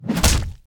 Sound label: blacksmith strike